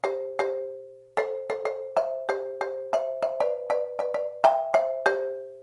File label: lead interlude, marimba